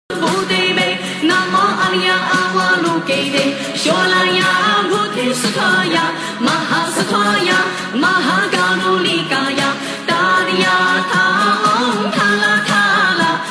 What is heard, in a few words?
chinese buddha song buddham sarnam gachhami